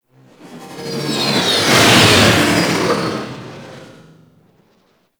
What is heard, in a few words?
Fighter Ship Close Fly By; Warframe; RailJack; Corpus; Space Fighter Crp Ship Engine A